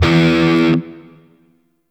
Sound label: Shawzin Oct One E; Warframe; Grineer Shawzin